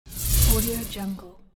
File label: rattle swoosh